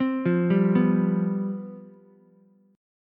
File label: C Major 7th Suspended 4th, down, Ukulele; arpeggiated